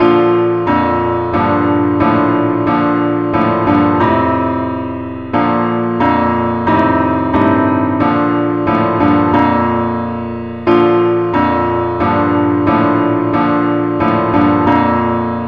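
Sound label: advanced accompaniment key B flat; Father, in Thy mysterious presence kneeling Fain would our souls feel all Thy kindling lov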